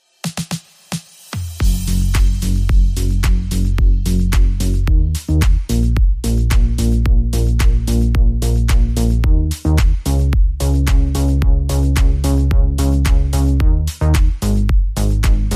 Drums Bass and SFX Only

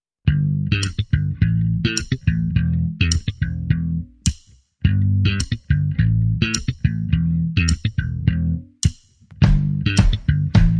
Almost Funky, Drums and Bass Only

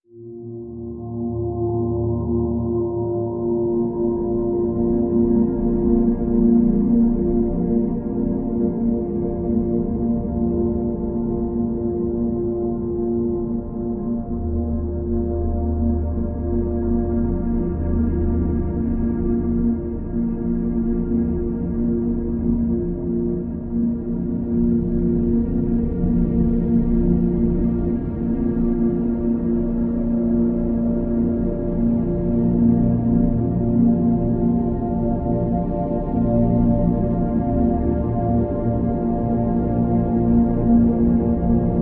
Pads Only

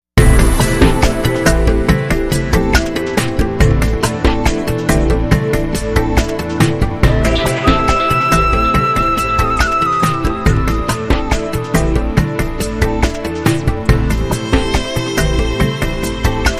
Arabian Lounge Club

